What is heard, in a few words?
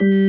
KEY, Org Ham; Club-50 - Foundations Roland; Organs